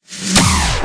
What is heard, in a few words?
Snare